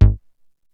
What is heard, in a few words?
HIP BASS, HIPHOP; Rubber Chicken Software Sample Bank